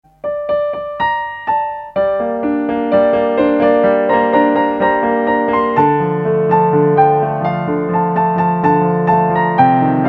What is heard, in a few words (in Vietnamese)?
piano khong loi